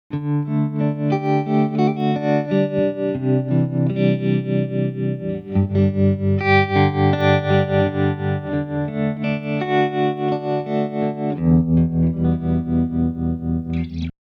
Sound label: Vibrato